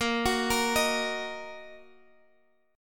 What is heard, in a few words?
Bb Suspended 4th Sharp 5th, Bb, down, Guitar, Half Step Down; arpeggiated